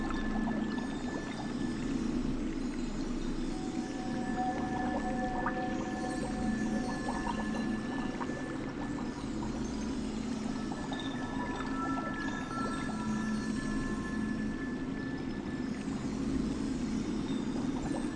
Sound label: radio flood